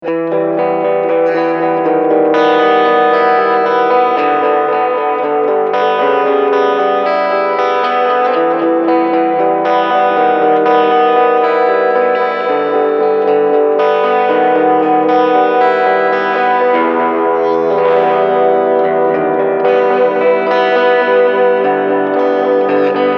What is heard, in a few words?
LoFi